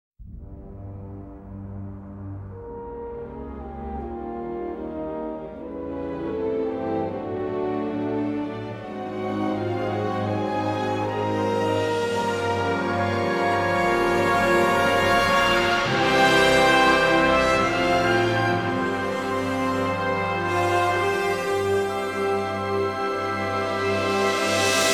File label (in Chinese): 纯音乐背景音乐